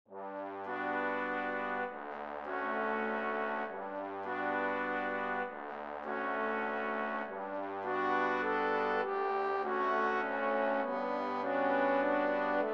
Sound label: Brass Quintet; Gymnopedie